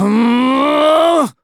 Vox Casting; en; Kibera; kingsraid

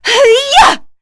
Vox Attack; Shamilla; kingsraid

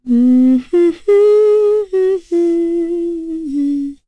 Hum, Vox; en; voices; heroes; Lewsia B; kings raid

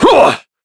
Vox Attack; Shakmeh; kingsraid

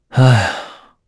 Vox Sigh kr; heroes; Lusikiel; kings raid

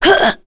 Attack, Vox; kingsraid; voices heroes en

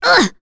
Vox Damage; Maria; kingsraid; voices heroes en

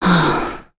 Pavel Vox Sigh, voices heroes en; kingsraid-audio

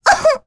Damage, Vox; en; voices; heroes; Annette; kings raid

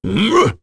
Vox Jump; Phillop; kingsraid

